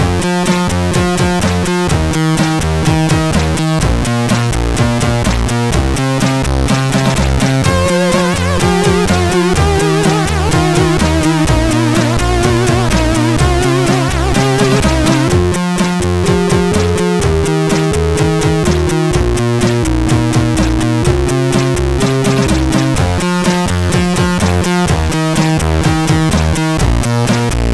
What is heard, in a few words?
Bassie, CSG8580R5